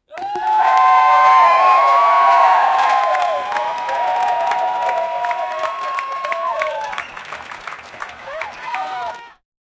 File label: army cheering